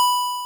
synth B6; osu

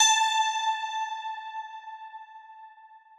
Crystal A6

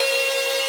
synth main

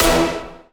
punch E5

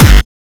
soft hit clap

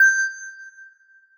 Bell G7

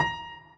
piano

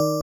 BELL; osu

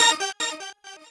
normal hit normal

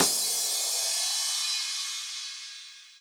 cymbals

